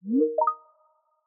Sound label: joined conference; Citrix; ICA Client; MSTeamsRingtones